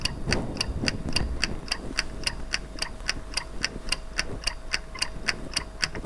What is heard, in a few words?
oven kitchen timer - clock